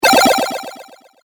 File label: Videogame Style Spell; Magic Sound Effects; fantasy sound effect